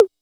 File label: kit, Yamaha Motif-ES8